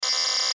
BUZZ DIGITAL DISTORTION; Multimedia; Buttons; Blastwave

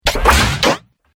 Sci Fi Impact; Tech Warrior

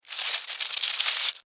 Insect Move Wet, Insect, Movement, Wet, Bug; Blastwave; Animals:Insects

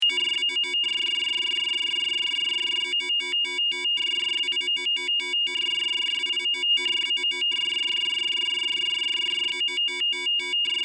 SciFi Scanner, Sci-Fi Scanner Sound Effect; Communication; Perfect for general Science Fiction usage or Multimedia usage; Crafted Signals

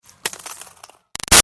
Ice Rock Drop Small Scrape Tinkle; Materials; Big Room Sound

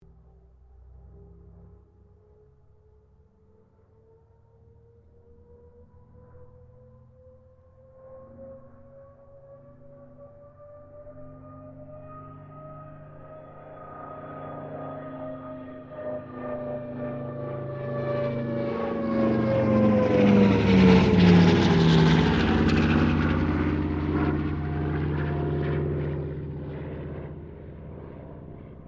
AIRCRAFT CA-13 BOOMERANG PASS STEREO

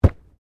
Body movement Organic Impact Heavy; Body movement foley to simulate falls and deaths; Wrestling And Struggling Sound Effects

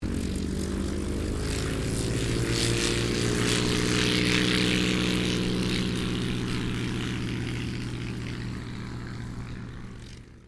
AIRCRAFT RYAN STM-S2 TAKEOFF; 1939; Airplanes; Vehicles

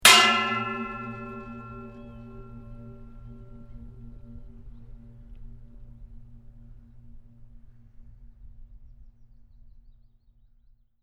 Metal Impact Hit Gong Like With Long Ring; Resonating metal pipe impact, long tail; Metal Sound Effects

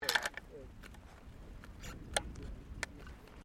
RIFLE 308 EJECT LOAD ROUND, Rifles, military

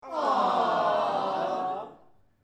group aww, Crowd Aww; Small Indoor Crowd , Mixed Group Of Men And Women Say " Aww ."; Exclamations; Voice

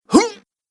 Lifter Male Strength; Recorded Voice

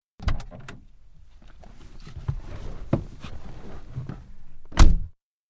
Interior - one person enters car, Peugeot 205GT; Cars; BBC

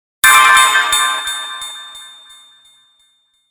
Hit Tonal Burst Flutter Delay; Blastwave

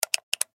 Button Switch Gear Multimedia; Web Interactive; Blastwave; Technology

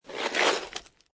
Snow And Ice Ice Skate Slide; Weather

